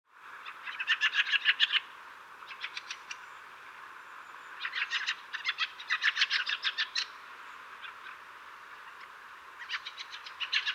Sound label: Smilginis strazdas Fieldfare - Turdus pilaris